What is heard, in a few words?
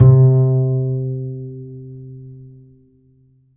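double bass C3, Jazzistic; DBASS; Univers Sons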